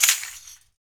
RAKATA, Afro Shakers, Ankle Pods; Roland LCDP14 Africa VOL-2

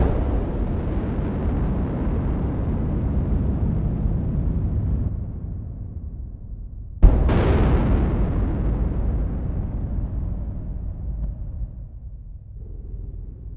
bomb; ANALOGICS 1; Back In Time Records - Big Bang

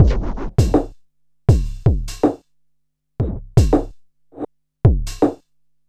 Street Tip, R&B Drums

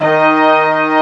BRASS 1 D3 -R; AKAI S6000 CD-ROM - Ultimate

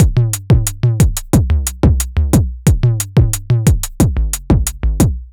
Tape 90 bpm, retro drum machine samples, Loops

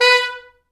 B3, Pop Brass; Best Service ProSamples vol.19; AKAI

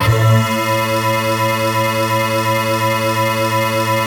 synth clav

